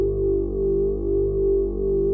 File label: JUNO 60 G2; Hollywood Edge - Giorgio Moroder Rare Synthesizer Collection